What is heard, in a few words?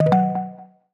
Call Join; Medal